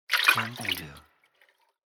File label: Draining Water in Silk